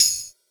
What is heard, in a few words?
shake; osu